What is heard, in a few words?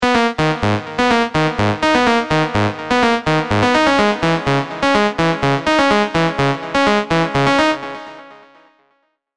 G#m 125, Techno & Melodic Techno MIDI Melody; Thermal